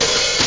drums hi open; Atari Mega Archive

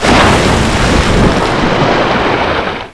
SPLASH

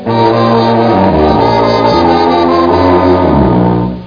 oomp!

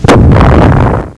atomic bomb; Foobar Versus the DEA

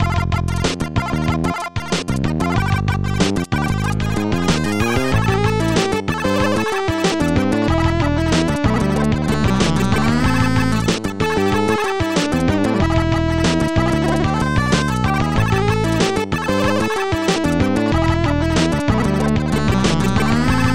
synth

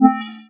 signal; NetStumbler 0.4.0

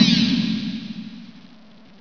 HIT; Terra; TERACIDE

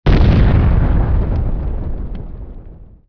explode; KATANA; Daikatana

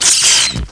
pain, player; X-Men

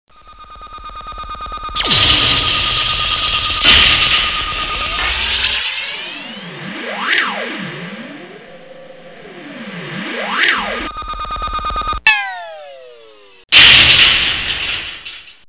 SAUCER; T.J. Finds a Friend

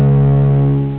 Lo Hum

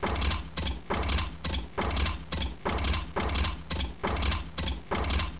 BYCYCLE

